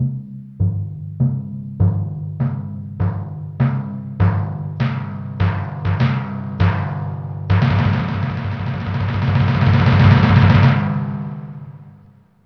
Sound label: timpani